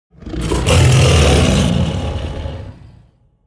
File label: tiger roar; soundscrate